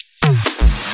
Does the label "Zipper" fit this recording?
No